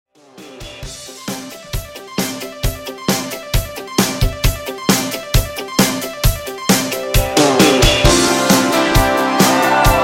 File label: 80s Pop Rock, 133 Bpm; ReDrum